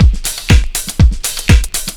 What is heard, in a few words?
LOOP; SoundFactory; DRUMLOOP